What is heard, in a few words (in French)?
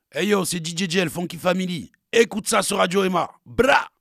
jingle